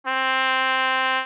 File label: trumpet